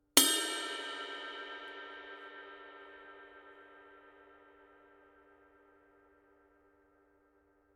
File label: 22 light ride bell; Poids: dessus moyen fin / dessous lourd; Paiste